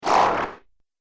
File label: Laser Alien Zap, laser gun